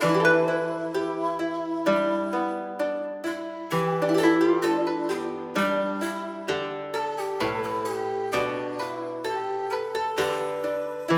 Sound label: Traditional Japanese